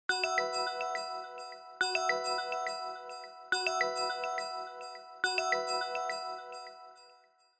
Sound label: Smartphone Ringtone Dry